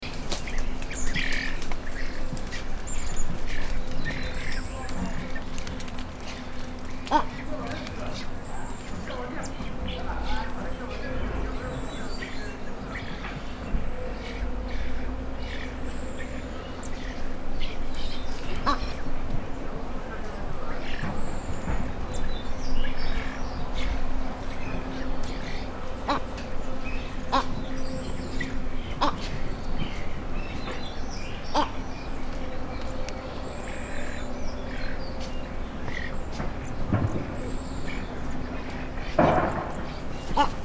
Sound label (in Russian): krasnonogiy ibis golos